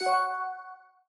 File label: win limbo